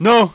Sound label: plead; rott